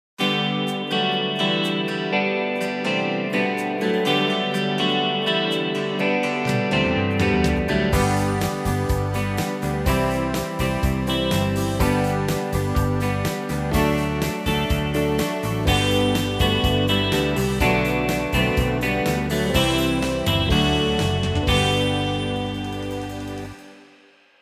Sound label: Country